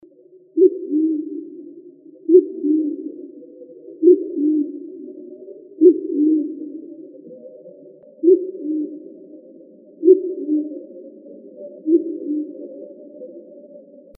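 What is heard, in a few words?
Band-tailed Pigeon, Doves; BTPI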